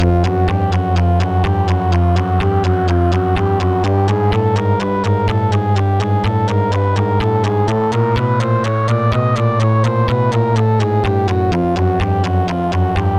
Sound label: stormy night; MusicLine